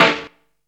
horrid, snares; Zero-G; Funky Elements

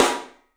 PIC SNR L, PICOLO ROOM, 3D Drums; 3D Sound

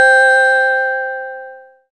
SYN BROKEN, SYN MicroWave; Sound & Vision - Gigapack I CD 2

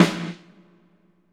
verb, snares; Sampleheads - New York City Drumworks VOL-1

